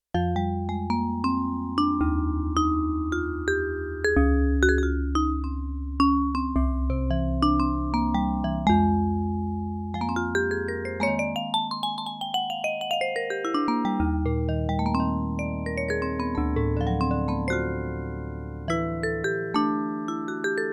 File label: Vibe; General Performance